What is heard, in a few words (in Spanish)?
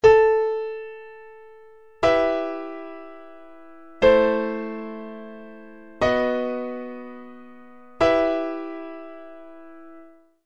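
cadencia rítmico; vitoria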